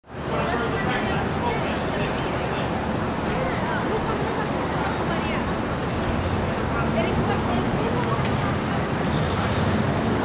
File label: traffic